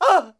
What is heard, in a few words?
plyr ht; quake; halo; hurt